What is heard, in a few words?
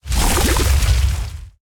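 projectile; Smite; Vulcan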